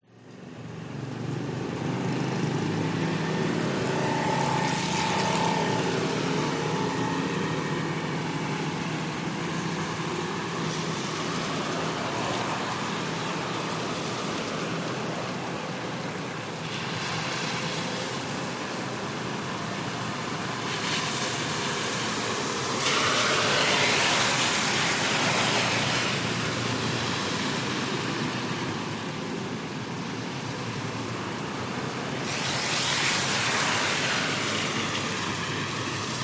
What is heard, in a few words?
vehicles traffic pass by through puddle wet road night thailand chiangmai